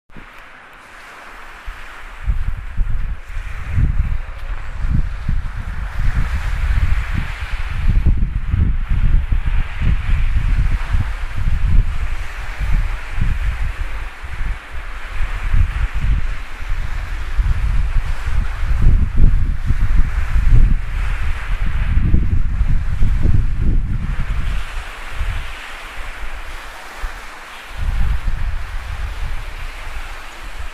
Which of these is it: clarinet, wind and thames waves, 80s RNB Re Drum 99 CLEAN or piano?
wind and thames waves